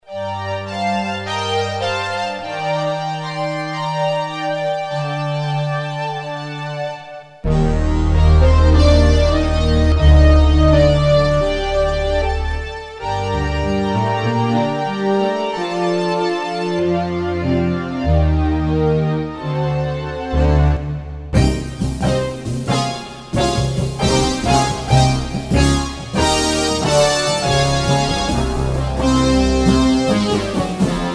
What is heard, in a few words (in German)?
orkester